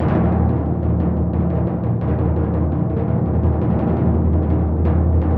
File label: TMP, Synclavier Percussion